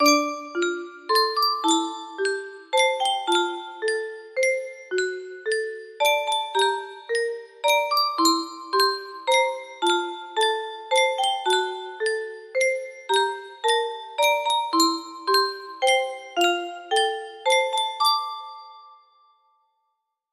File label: music box part, music box melody